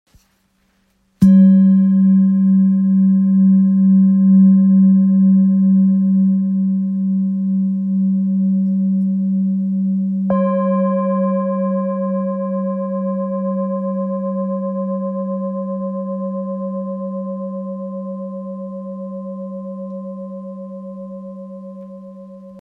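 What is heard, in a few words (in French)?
Bol therapeute do, bols chantants tibétains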